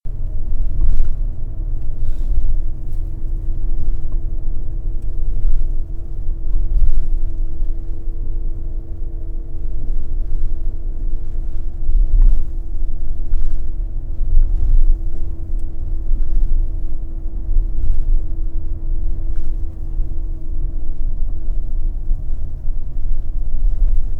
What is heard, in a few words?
midnight bus